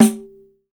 p pandiero op; 300 Drum Machines; Yamaha Motif ES-Series

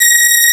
SUS, FAIRLIGHT CMI IIX STRINGS

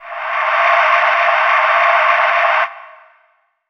syn, Chillout Synth Atmos; Best Service ProSamples vol.36 - Chillout

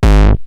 Roland TR-909 BD; BD Selection

